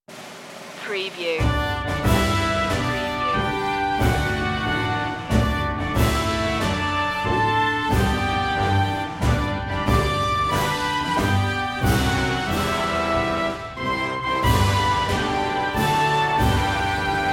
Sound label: happy birthday to you orchestral